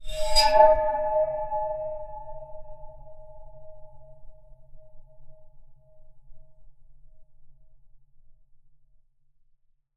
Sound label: Waterphone Bowed Short Contact Mic; The Sound Catcher; Liquid Metal